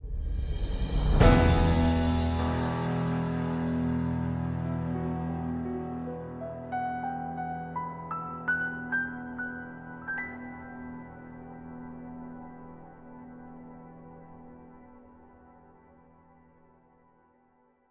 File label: Boss Defeat Music